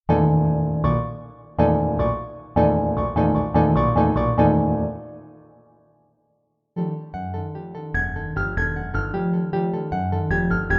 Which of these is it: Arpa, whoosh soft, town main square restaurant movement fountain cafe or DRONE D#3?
Arpa